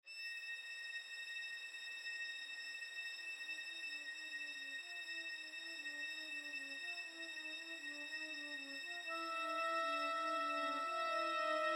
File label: Horror Scene w o Dialogue; Iron Man